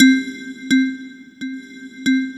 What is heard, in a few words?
C3, Synth Line; 90 s Sample CDs; NiceBeats; SynthLine VOL-2